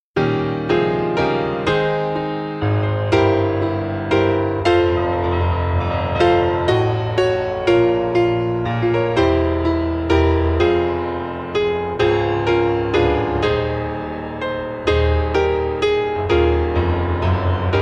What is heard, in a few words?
Piano Version